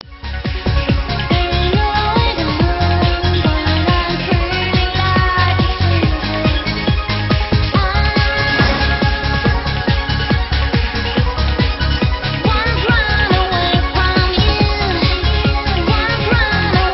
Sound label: guitar mix